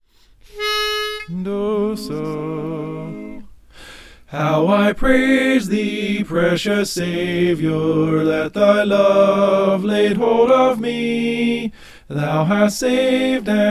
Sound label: channels only soprano